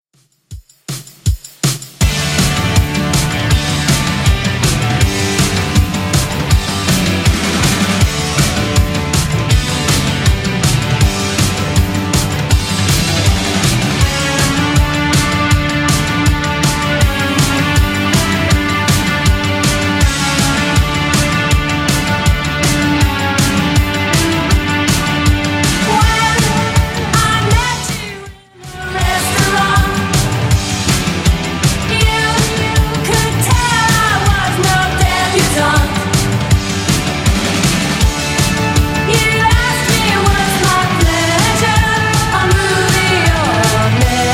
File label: 70s Redrum 160bpm